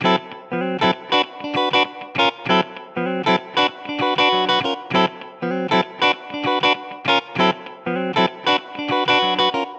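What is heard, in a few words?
Guitar R&B D major 98bpm; Guitar and Bass; Ableton; Factory Packs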